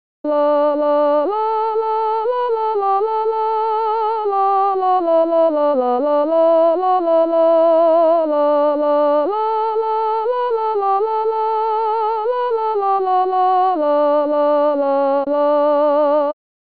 soprani

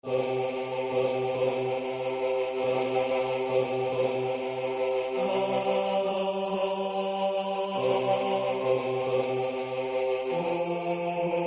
stille nacht bassi 3 strofa; Silent night

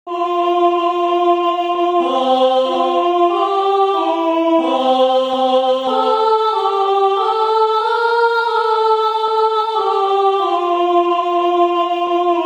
Venite fedeli contralti

